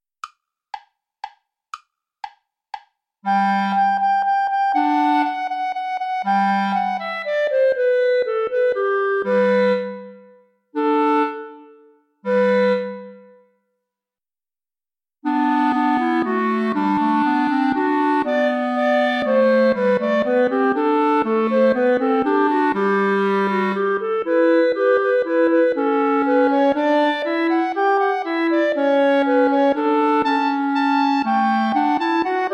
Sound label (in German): alter ungarischer tanz play along without clarinet